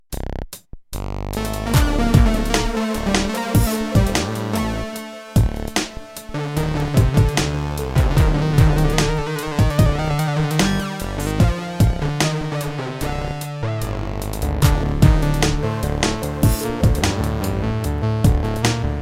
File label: Screeching lead, Poly patches; korg mono poly